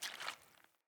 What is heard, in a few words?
Boot Walk Water, Footsteps; Characters; Enemy; Boots; Sinecure; Melee Grunt